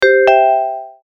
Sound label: Entry Chime, Bay Area Rapid Transit